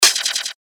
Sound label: FX Hat, cymbals; revealed cymbals vol 1